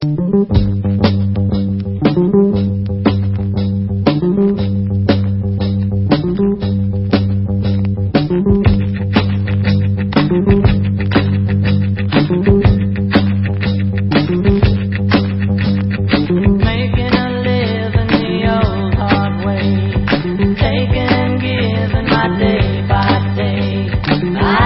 Instrumental

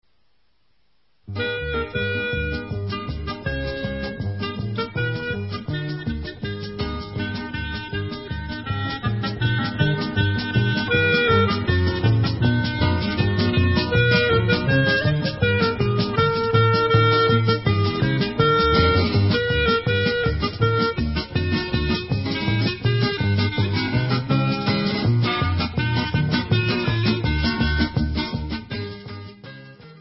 Hora Instrumental

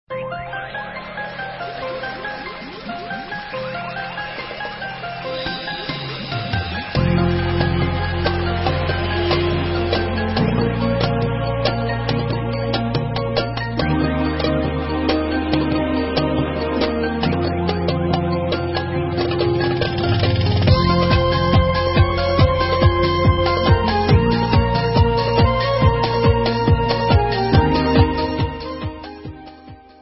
Instrumental

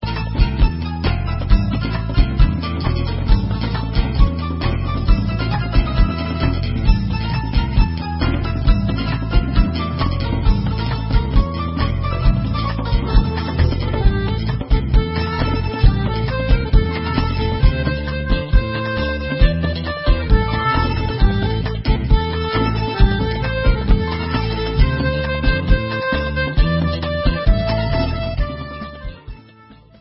Debka Instrumental

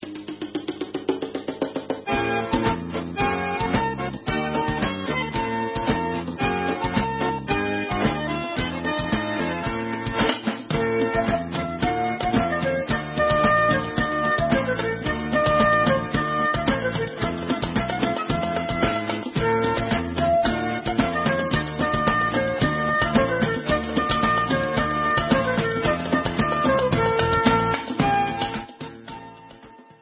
Debka Instrumental

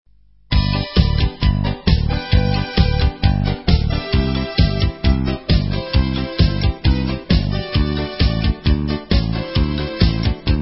Instrumental

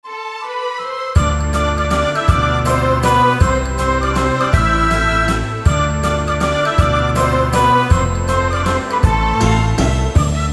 mazurka

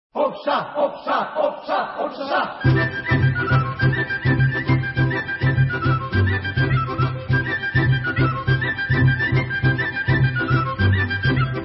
Kolo Serbian; Hajducko

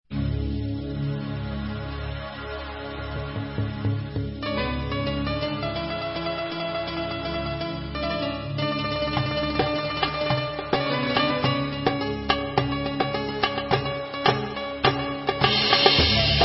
Instrumental

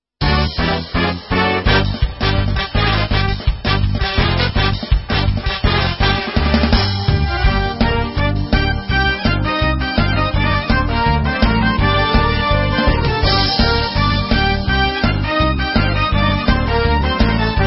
Instrumental

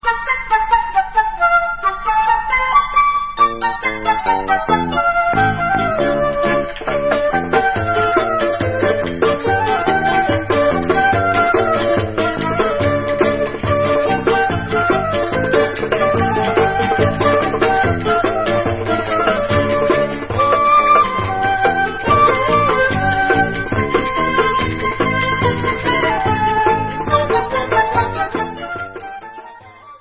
Instrumental

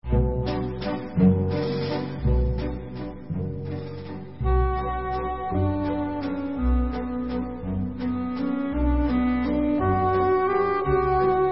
Instrumental

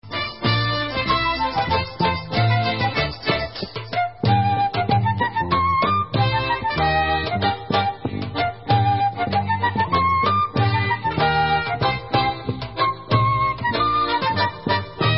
Instrument

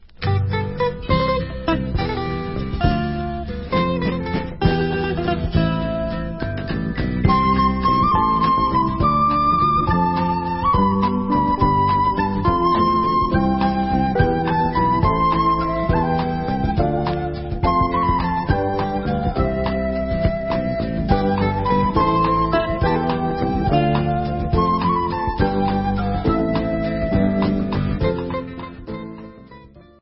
Instrumental

